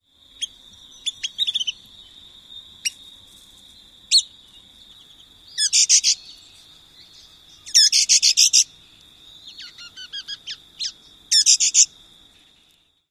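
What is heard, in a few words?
BROWN-HEADED NUTHATCH calls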